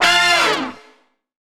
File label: F STAB, funky; Loopmasters; Funky Underground Vol 2